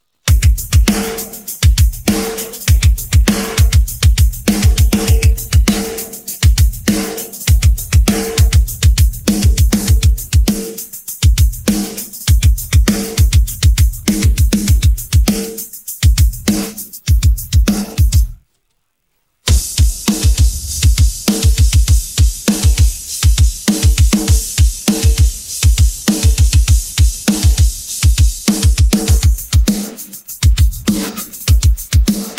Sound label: drums